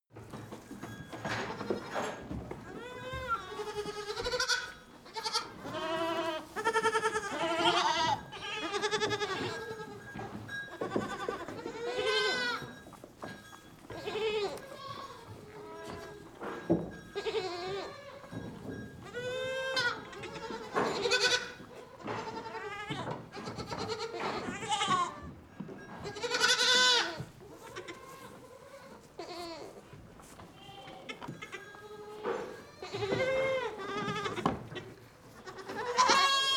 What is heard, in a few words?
goat barn ambience lambs